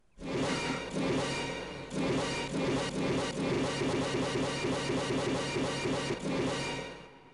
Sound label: camera zoom rapid faster; kung pow